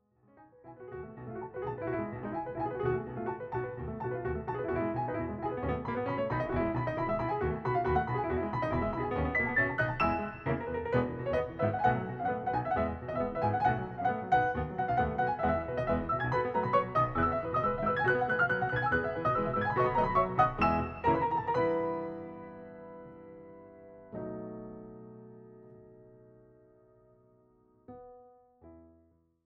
Hungarian Dance in E Minor- Poco allegretto – Vivace; brahms; 21 hungarian dances; WoO 1